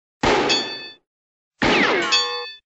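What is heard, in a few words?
cartoon shots into metal object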